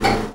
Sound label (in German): druckregler fuellen, volvo 9900; Vehicles; AI volvo 9900; Freyfurt